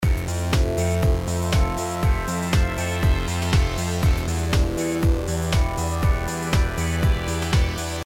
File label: synth recipe